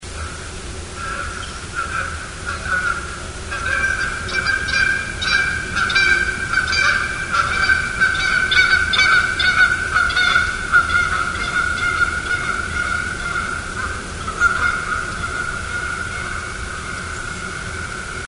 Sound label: Geese